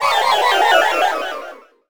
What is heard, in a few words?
Scifi Synth Tone Powerdown; Energy & Forcefields